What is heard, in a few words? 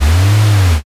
servo